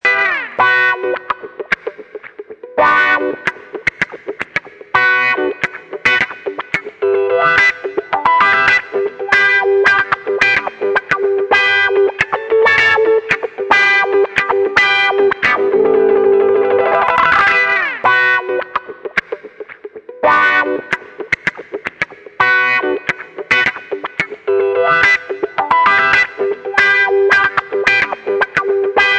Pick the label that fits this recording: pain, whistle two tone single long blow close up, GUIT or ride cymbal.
GUIT